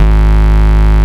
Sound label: Bass, Root G#; Vengeance Samples